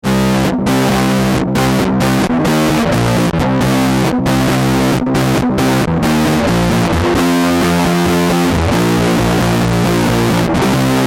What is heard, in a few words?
condor, both pickups - Sili-Face direct, then through Condor (50% Gain); Condor Cab Sim